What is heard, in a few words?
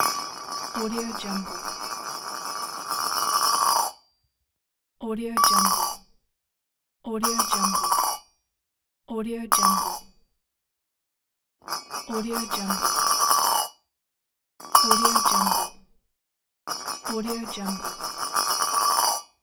metal dish drop and turn